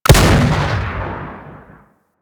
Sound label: Anti Aircraft Gun Projectile; Weapons; Warframe; Archwing